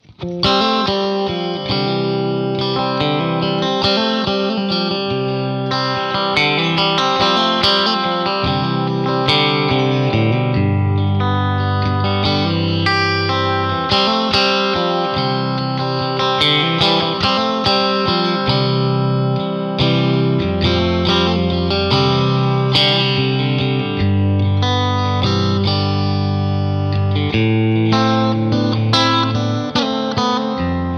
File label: Strat Clean